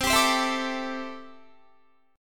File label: C 6th Add 9th C down Guitar; C6add9 strummed